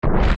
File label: Swell; Barinade; zelda; OOT